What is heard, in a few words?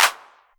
808 Clap